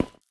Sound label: Footstep Solar Panel